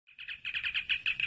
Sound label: communicator